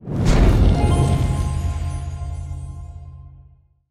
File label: UI Campfire Unlocked